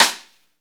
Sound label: snare, Roland